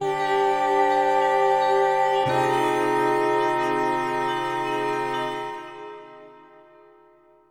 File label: SYNTHPAD AMBNT 160 C; eJay; Ambient; Sphere